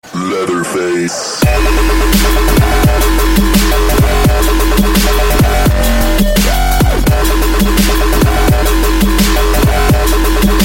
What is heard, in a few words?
Dubstep